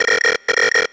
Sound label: Call sound; blastmorph pinball; xbox series xs